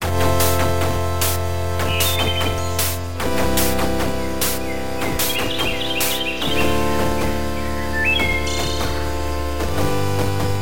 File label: town music